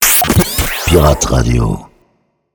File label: sweeper medium